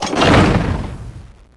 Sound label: Catapult Shoot Sound; Heroes3; War Machine